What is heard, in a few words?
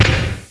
Uzi